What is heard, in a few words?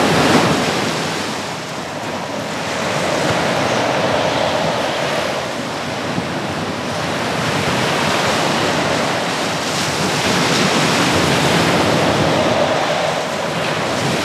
Ambience - Wind